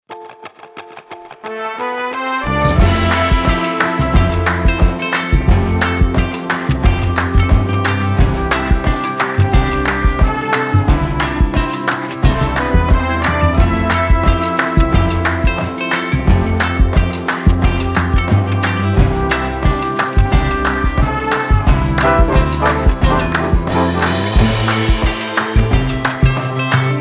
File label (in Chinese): 吉他 Electric Vintage; Session Guitarist; Komplete